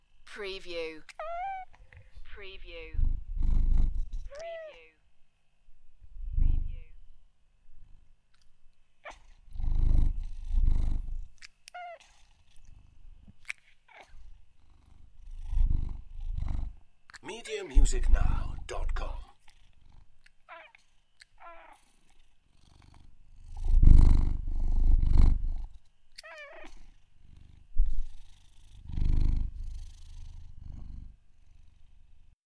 Cat meow and purr; beanstalk audio

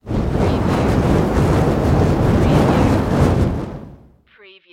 Fireball Fire Wave Short Burst

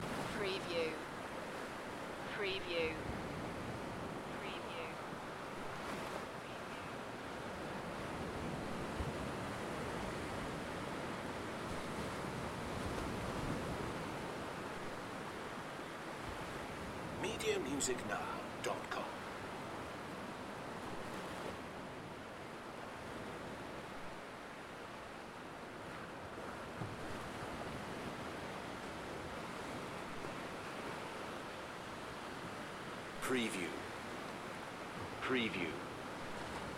Spanish waves at night; beanstalk audio

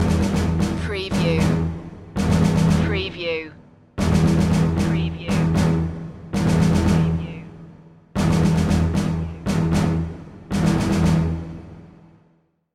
conflict stinger

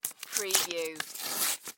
Envelope Tear Open; Sound Effects Bible

